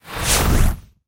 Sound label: SFX Fight Magic Ball; mighty party 2017 gamerip pc ios android